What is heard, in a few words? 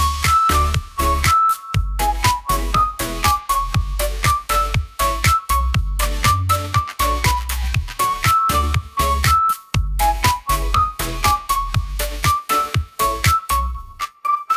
upbeat pop melody, ringtones; ai